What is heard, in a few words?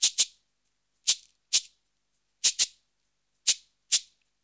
Modern Percussion Loops; Soundscan vol.56; AKAI